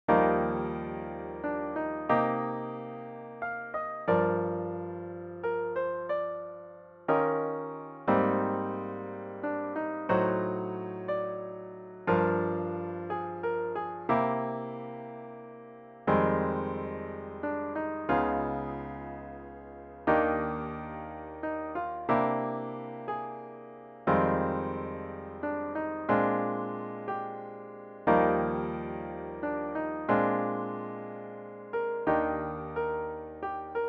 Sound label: solo piano